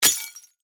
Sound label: Warfare, Bullet, Impact, Glass; Combat